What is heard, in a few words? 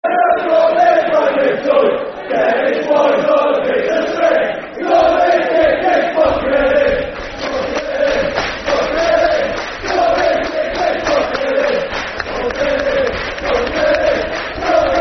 Football Chant; Dennis Wise On A Piece Of String; Swindon Town Stfc